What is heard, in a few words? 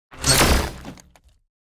impact, sword; bane